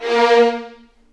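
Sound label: A#3 violins; The Ultimate String Collection; East West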